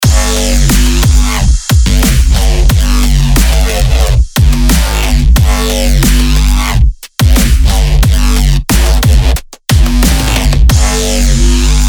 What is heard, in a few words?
gritty; black octopus sound